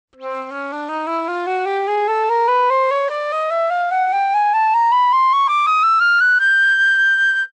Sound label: flute